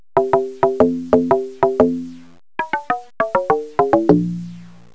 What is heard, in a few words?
Tabla